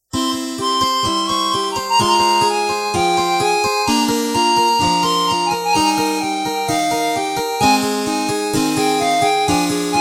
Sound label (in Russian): Звуки клавесина; минусовку; детской песни